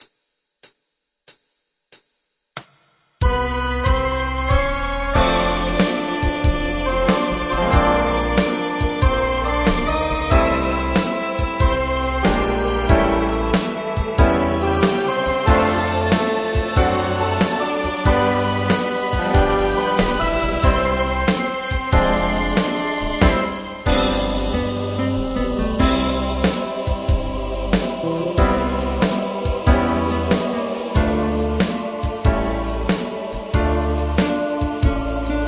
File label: instrumental; traditional